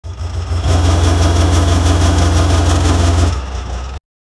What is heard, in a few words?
rpm sound diesel cummins, engine